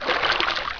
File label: in h2o; Freeciv